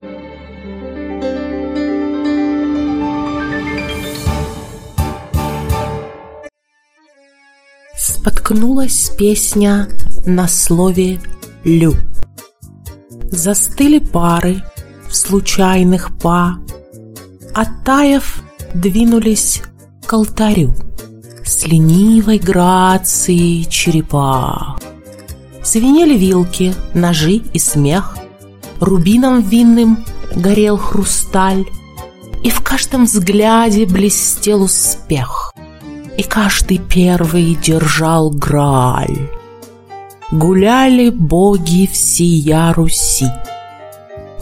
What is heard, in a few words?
tango